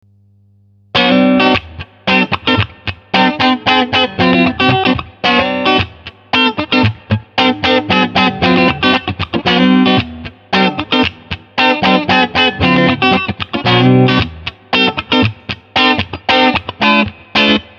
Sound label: boost rythm; tc electronic pedales classic